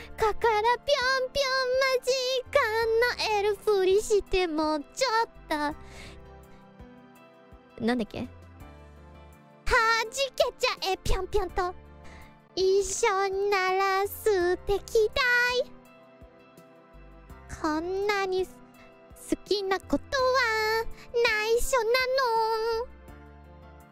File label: Singing; IRyS